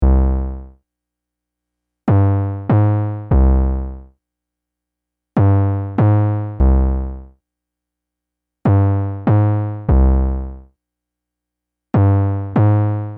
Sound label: TR808 Kick Bass